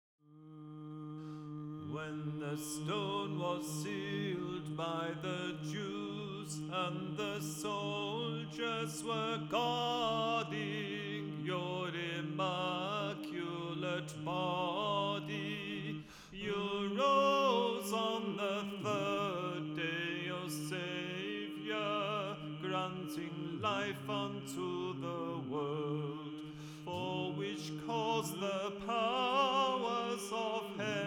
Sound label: Resurrectional Dismissal Hymn First Mode